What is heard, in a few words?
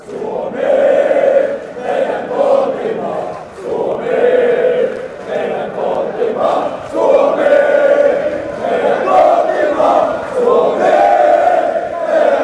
finland chant